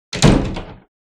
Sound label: door; CLANNAD FULL VOICE; Key